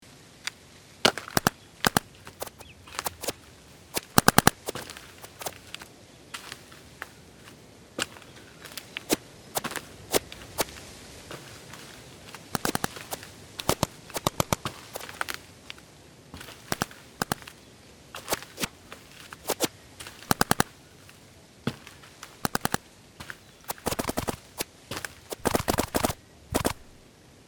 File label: Paintball field ambience firing shots